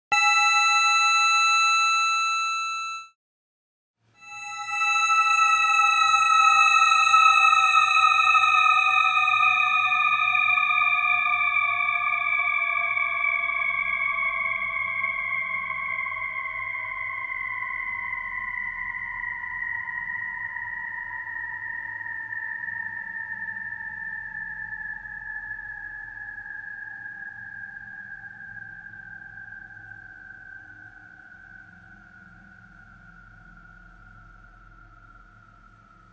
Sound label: PitchDown Swell; meris mercury7